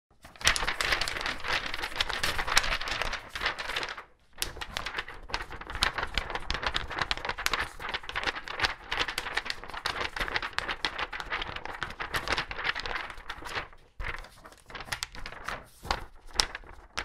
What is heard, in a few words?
Flipping book in wind; ورق خوردن کاغذ کتاب در باد